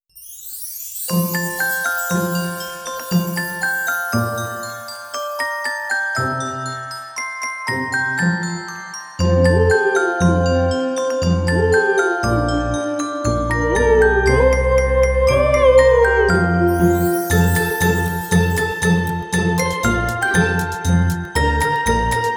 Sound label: Jingle bells clasic